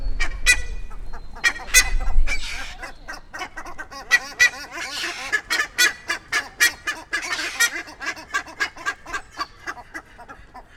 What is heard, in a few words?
Theristicus melanopus duet